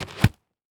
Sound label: Foley Footstep Work Boots Dirt Debris Single Step; Foley Footsteps